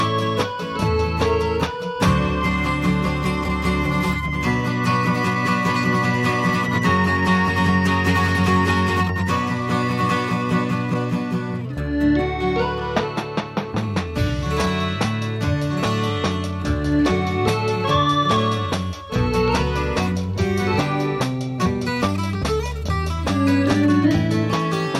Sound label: Waltz